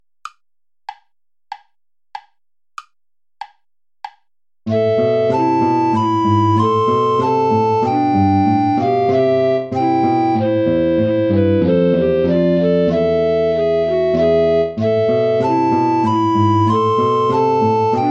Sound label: play along without alto recorder